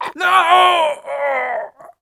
death; cstrike; zombie plague

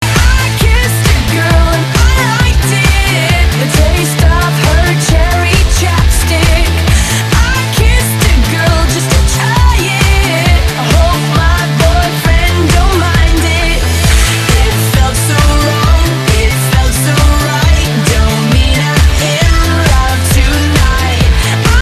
retro

